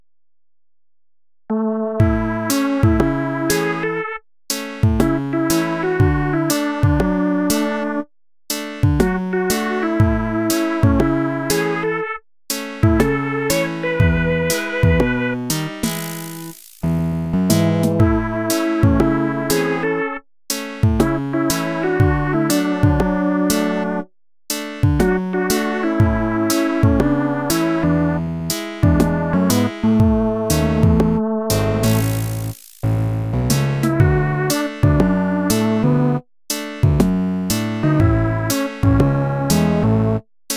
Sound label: chill; music